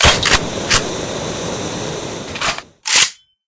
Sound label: reload; cstrike; AWM MONSTER